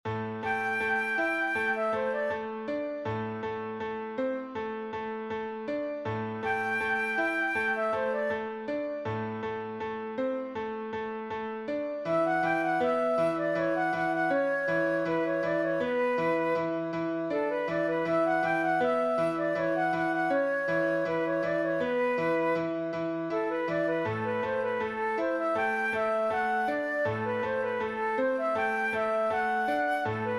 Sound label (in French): tourne intro et deuxième voix B FLUTES